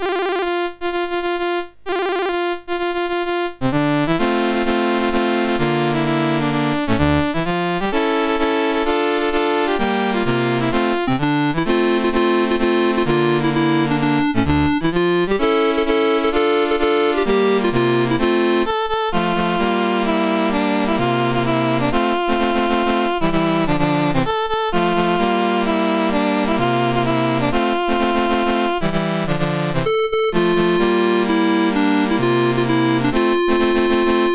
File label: Algeria National Anthems, MOS6581R3